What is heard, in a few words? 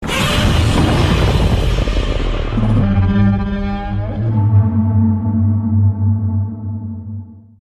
medium roar; godzilla